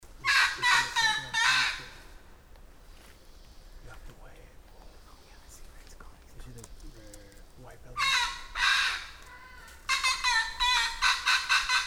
Red-throated Caracara, Panama; Burbayar Panama

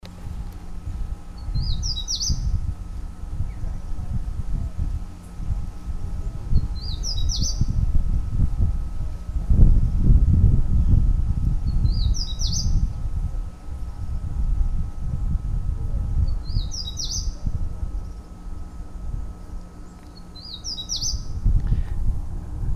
Plain Wren; Ammo Ponds

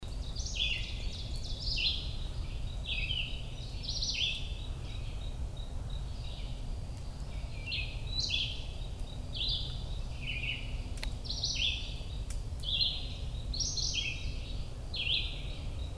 Red-eyed Vireo Singing; Millington WMA MD

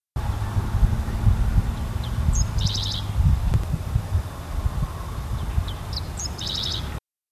Dickcissel, Dorchester Co MD

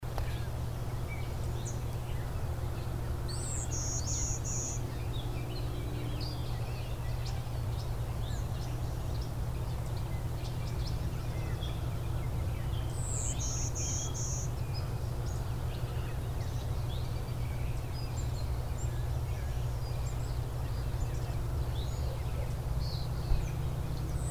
Golden-winged Warbler; allegany md

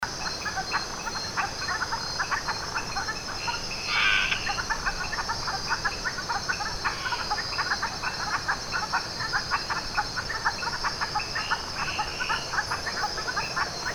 Frog Chorus; Canopy Lodge

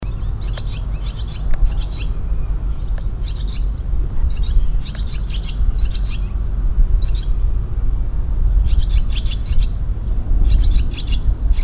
Barn Swallow pre-dawn; Dent Road MD

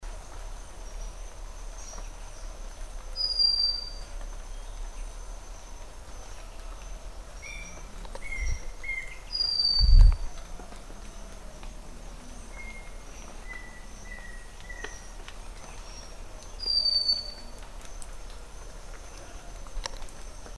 Scaly-breasted Wren, Panama

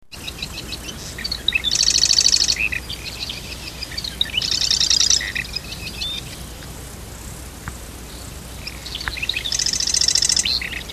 Marsh Wren; Dorchester Co MD

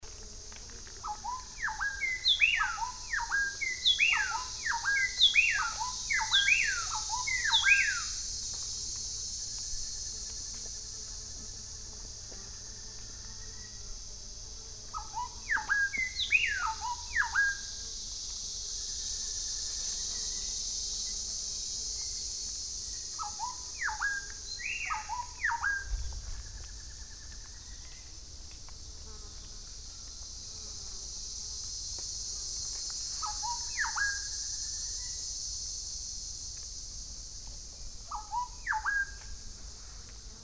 Black-bellied Wren Duet, Panama

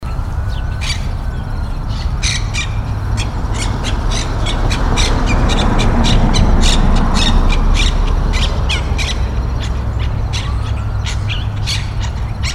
Monk Parakeets; Laurel MD

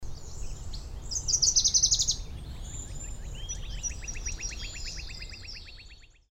Wilsons Warbler; Finzel MD

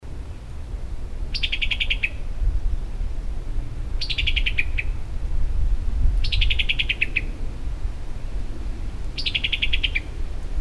Summer Tanager

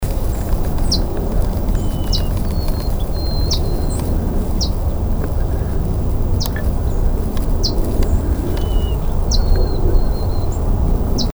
Golden-crowned Sparrow

